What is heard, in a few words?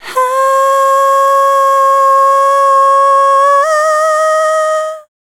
Dance Vocals, FEMALE